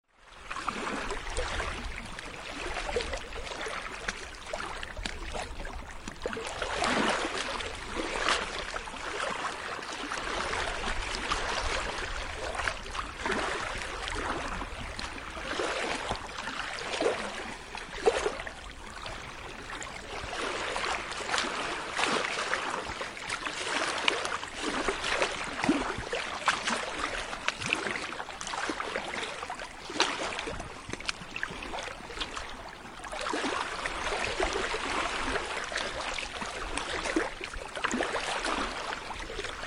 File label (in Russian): ozero